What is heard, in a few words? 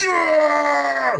scream; cooler